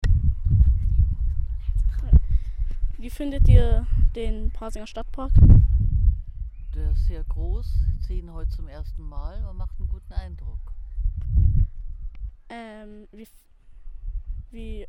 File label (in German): Pasinger Stadtpark